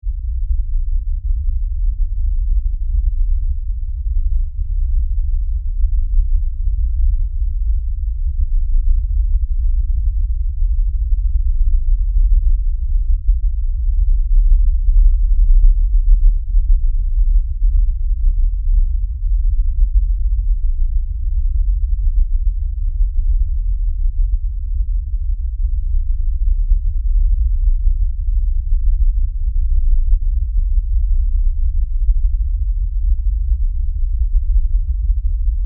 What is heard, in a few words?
Tremor LFE Rumble, Scifi, Tremor, Lfe, Rumble, Science, Fiction; Long, Earthquake